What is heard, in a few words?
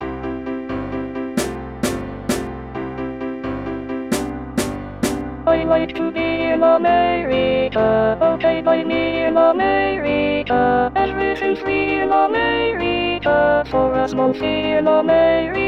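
America Alto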